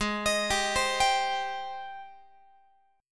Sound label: G sharp Major 7th G sharp, Guitar; G#M7 Chord